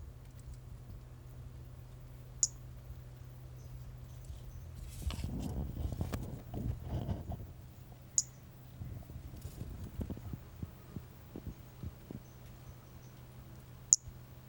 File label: Brachycephalus albolineatus